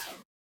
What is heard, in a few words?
retro game laser